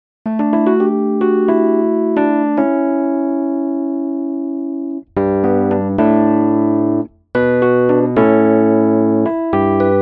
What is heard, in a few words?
electric studio live